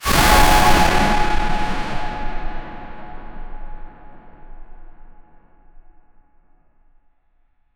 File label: stadium crowd noise with